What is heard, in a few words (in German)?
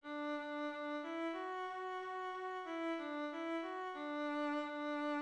Rhythmus Ubungen; Notenlesen lernen